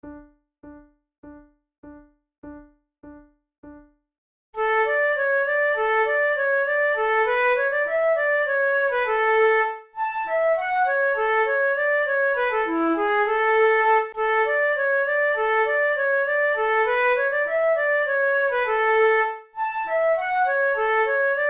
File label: allemande, flute